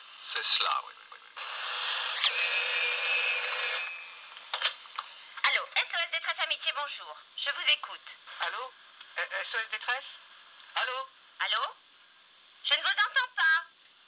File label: pan